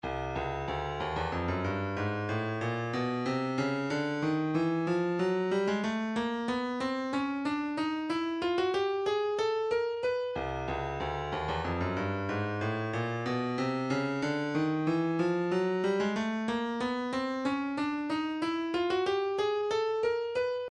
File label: 120 fx loop; Slide